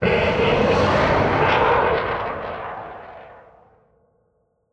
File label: city battle